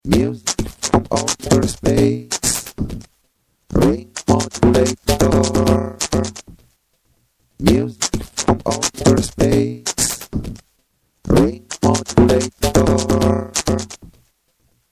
squared voice with drum; analog synth; ring modulator

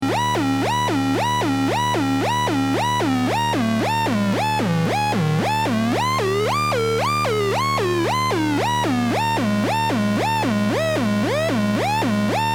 Wherrr Ooooh; analog synth

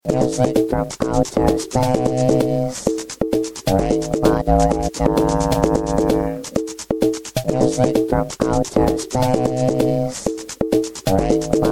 ring modulated voice with drum; analog synth; ring modulator

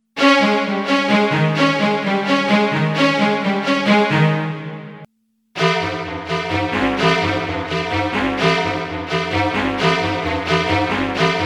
Internal Osc X Synth Strings, ring modulator; analog synth

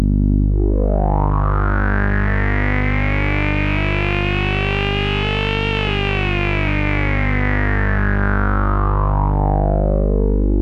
sync effect; NOISE TOASTER

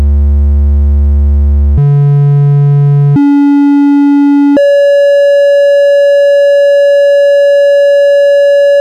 vco triangle wave; analog synth 101

